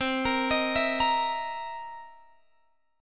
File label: C 7th Sharp 9th C Guitar Open G